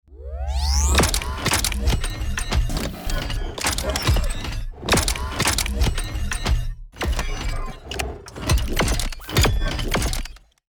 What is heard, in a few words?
Sci-fi repair kit cybernetic futuristic